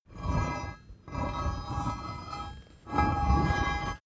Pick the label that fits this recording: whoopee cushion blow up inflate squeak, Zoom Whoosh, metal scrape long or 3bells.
metal scrape long